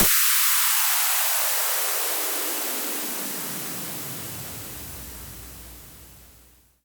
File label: Tension White Noise Down, 140 BPM; Cymatics